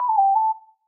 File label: Whistle Notification